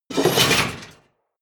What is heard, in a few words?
chest metal medium close